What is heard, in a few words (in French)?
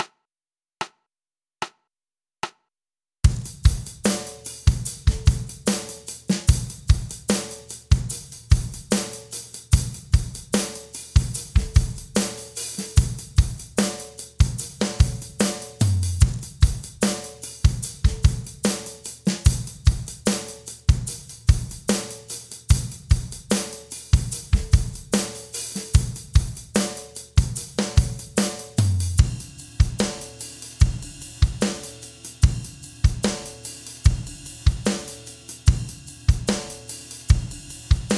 Batterie 74 Bpm